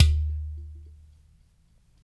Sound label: UDU, Supreme Beats - African Contem; Spectrasonics; SB Udus